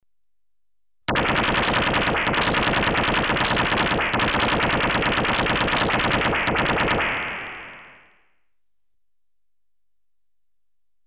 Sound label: Stun gun (prolonged bursts), Electronically Generated Sounds; Sound Effects Library